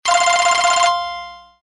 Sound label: Basic Tone